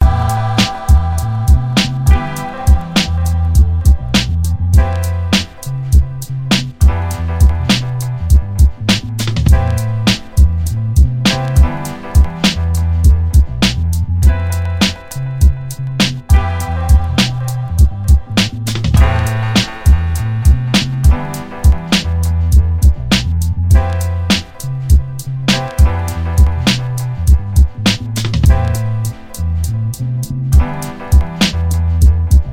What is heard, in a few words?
beat